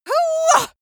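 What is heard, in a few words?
female combat attack long, screams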